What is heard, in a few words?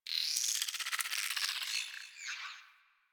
Venus Landscape Close Texture; Ambience; Warframe; Venus Open World